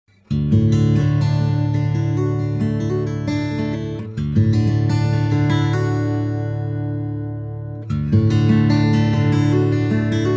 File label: Guitar